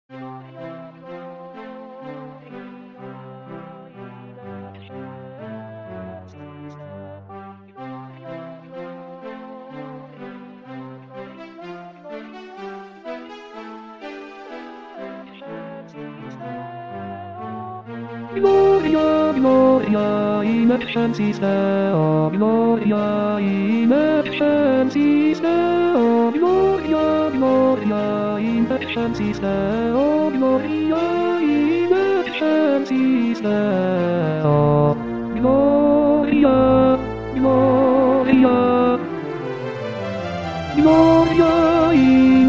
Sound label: Tenor; Puccini; Messa; Gloria